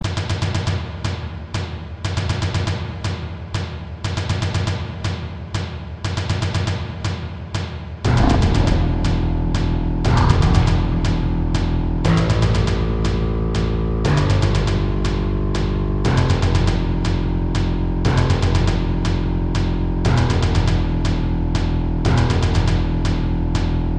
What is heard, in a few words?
Fire Intro; Musi Fire Intro V1